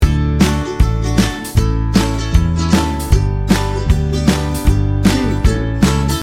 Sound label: ukulele whistler loop